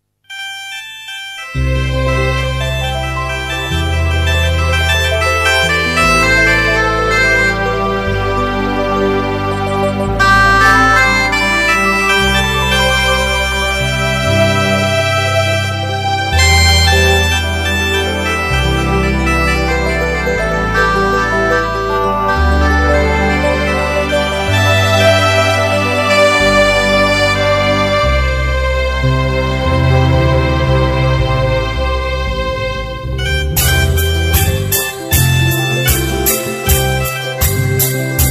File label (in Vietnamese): mua roi beat